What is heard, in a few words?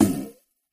Bowl Fart Windy; farts